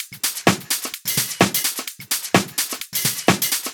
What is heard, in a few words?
House Loop, 128 BPM; Vengeance Samples